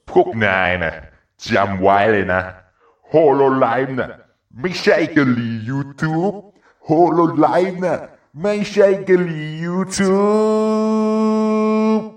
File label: win; ff2